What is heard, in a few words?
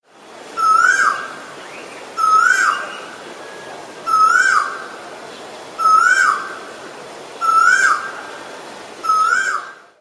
Koyal